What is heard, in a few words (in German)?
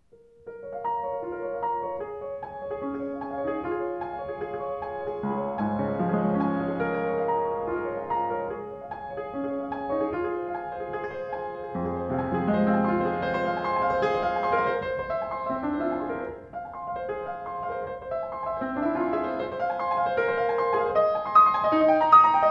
Sauter, klavier; 114 sauter carus bj 2013